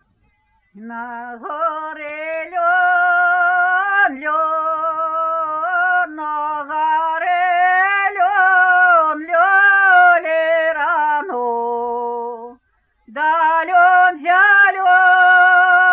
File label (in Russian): Жнивные песни Себежского района; д. Бондари; "На горы лён"